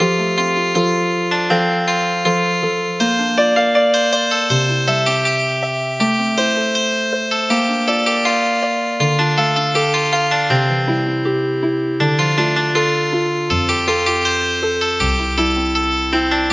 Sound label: Healin Harp